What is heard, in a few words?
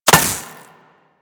Laser Impact Metal; Warframe; Weapons